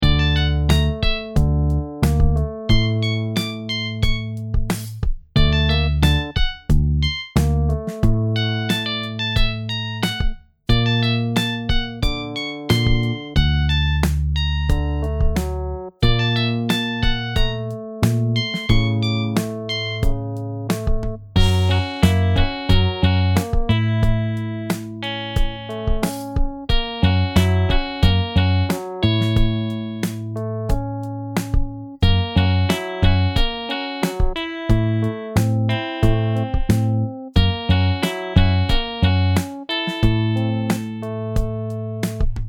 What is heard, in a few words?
D E F A Bb C C, guitar modal scale